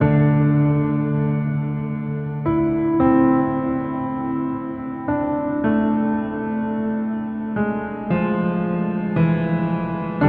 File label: Piano Theme; Sonic Foundry (Sony Creative Software); Numina II